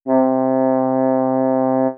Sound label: c bassoon